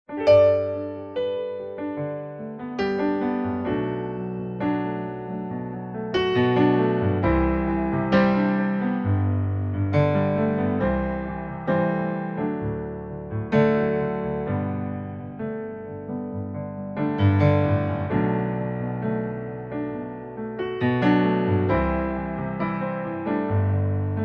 sängerin Pianoplayback G-Dur

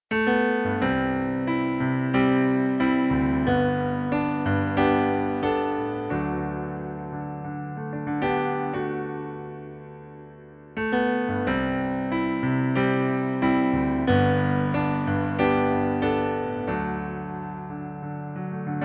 Pianoplayback D-Dur

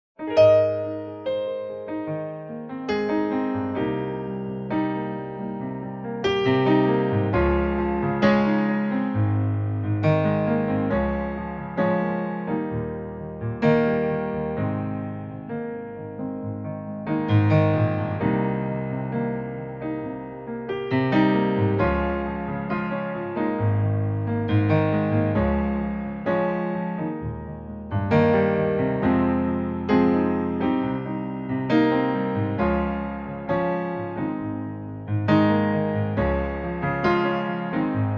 sängerin Pianoplayback G#-Dur

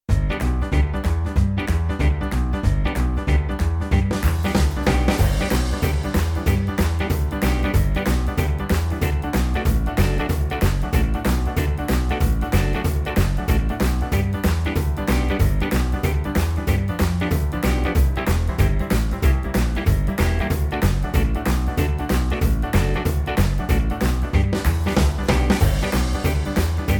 vollinstrumentiertes Playback G-Dur

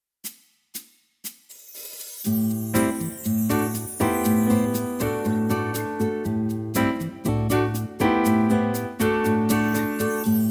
vollinstrumentiertes Playback BossaNova a-moll

